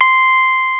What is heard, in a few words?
Count Down End